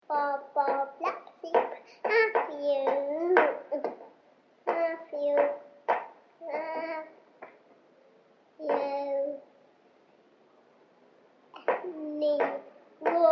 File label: baa baa black sheep